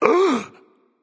breath, voicepack; smite; jorm

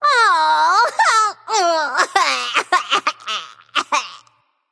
boo hoo; daji

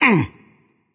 grunt hit, voicepack; smite; vamana

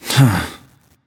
nv sigh; smite; voicepack; mercury

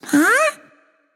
confused, voicepack; smite; jorm

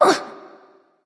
surprise; cupid

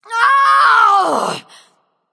ouch; smite; sol

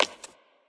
footstep; smite; scylla

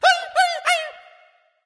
wolf; fenrir

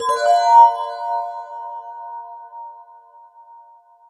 aoe; smite

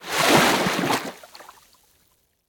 splash emerge; smite; poseidon

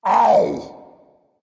ouch dragon; fafnir

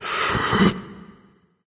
nv breath, vox; smite; voicepack; vulcan

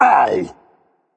ouch berserk; cuchulainn

